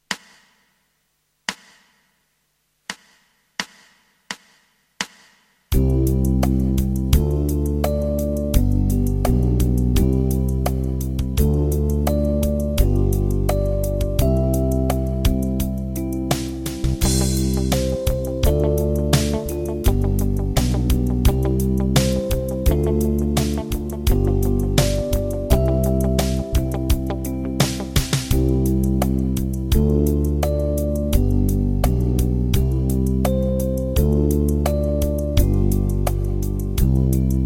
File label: Duet, Guitarbacking